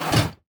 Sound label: tower support Blades spawn; twlight Longbows